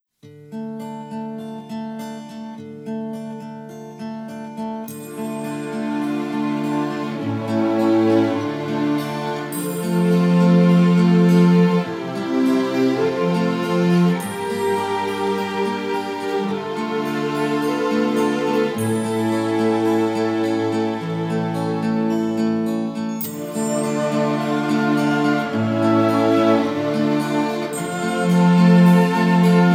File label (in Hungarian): Instrumentalis - meditacios - Kyrie; Fillers